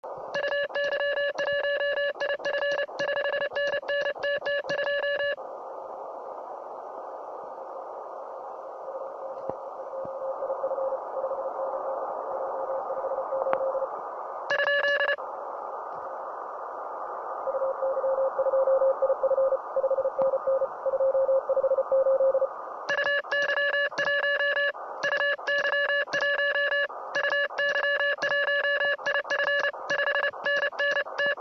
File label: 80m QSO